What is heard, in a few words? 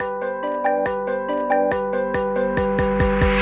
Lofi Music Intro Stinger; Kawaii Love Lofi Music Pack 2